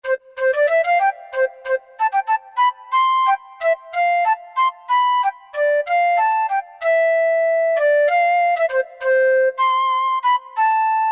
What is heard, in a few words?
Flute, Korg M1; Factory preset examples